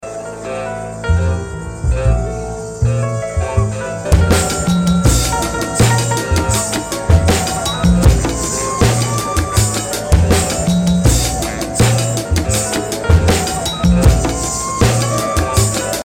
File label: ghost jazz